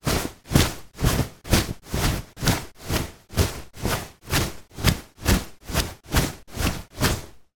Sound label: foley shorts cloth walk; zapsplat